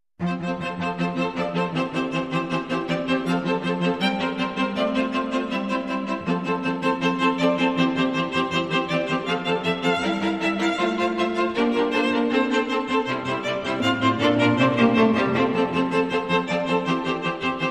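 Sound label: Quartet, More Reverb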